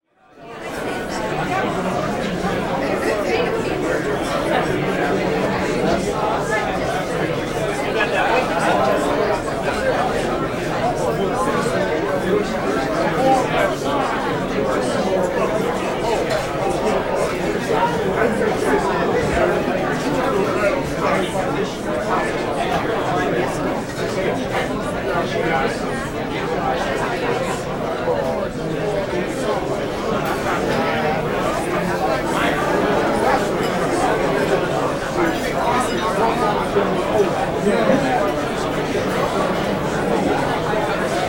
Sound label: Bar Pub Busy Bar, Walla; Sound Ideas; Walla Series